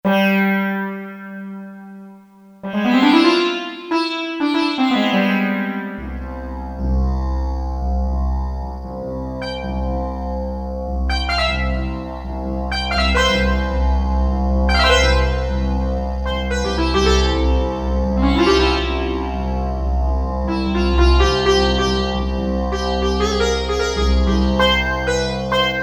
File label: Sitar, Indian Inst; Korg DSS-1